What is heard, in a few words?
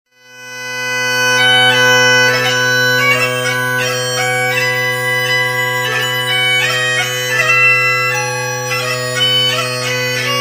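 Scotland the Brave, bagpipes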